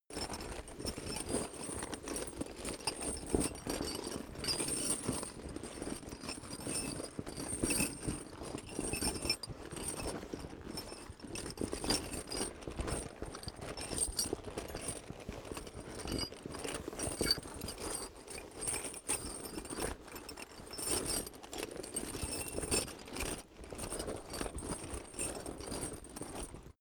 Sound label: metal backpack parts rattle